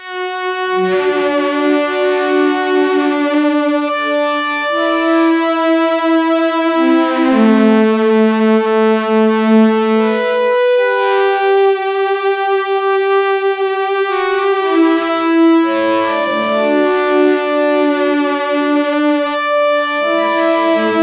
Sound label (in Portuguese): sintetizador moog a tocar; synthesizer